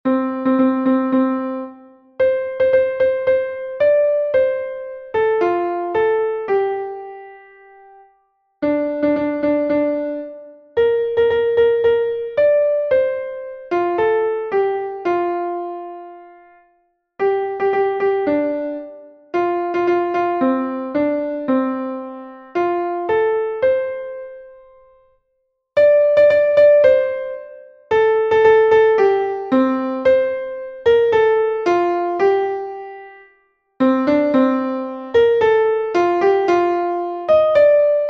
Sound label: school song melody